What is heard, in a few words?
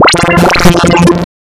303, wavetables; waveedit256; PLAITS